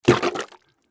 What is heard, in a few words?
Toilet Plunger, Toilet Plunger Water Gurgle; Household; Bathroom